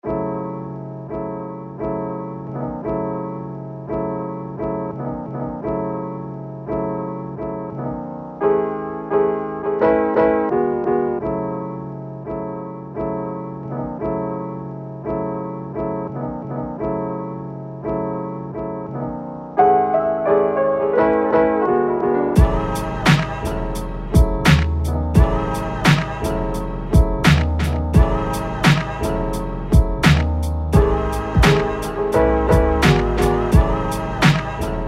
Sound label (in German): Jazz in E Dur